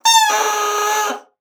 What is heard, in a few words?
BASSOON; Xsample VOL-6